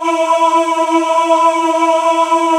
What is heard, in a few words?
ANGLE CHOIR E; ANGLCHOIR